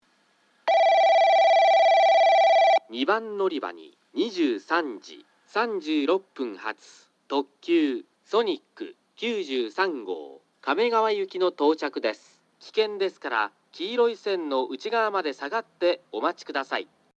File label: kurosaki sonic kamegawa